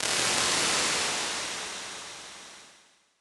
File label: Textures Cloud, ImpulseResponses; Ableton Live 12 Lite; Hybrid